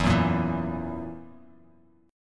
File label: E Suspended 2nd Flat 5th, Bass Standard, chord; strummed; JGuitar